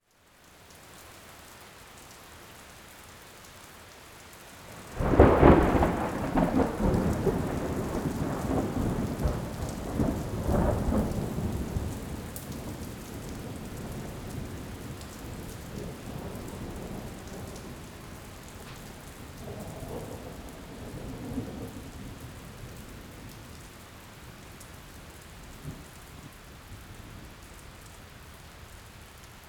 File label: Ambience Storm From Inside Garage With Door Open; AdobeCC